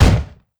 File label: helicopter machine gun single; Rust